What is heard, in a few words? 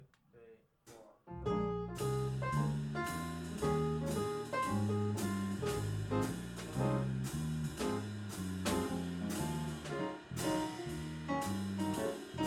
jazz Trades